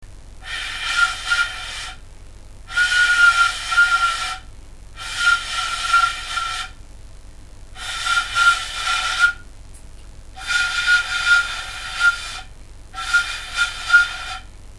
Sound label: flute